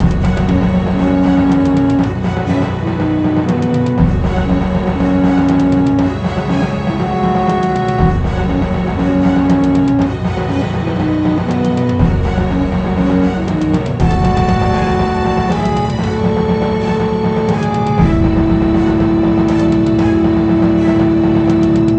music combat (loop); PC Gamer IT CD 32; MECHCMDR

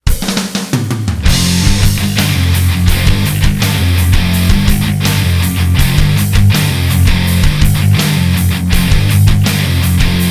lose; splatmagazinerenegadepaintball

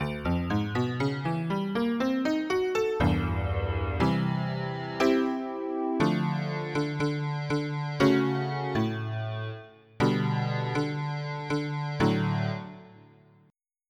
Honky Tonk

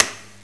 shot

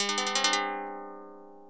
boot tune; SGI O2 Out of Box Experience 2.2; systour

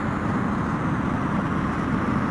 wet asphalt surface; xmr

